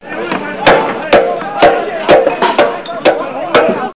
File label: CLAMOR; Simon and Schuster Interactive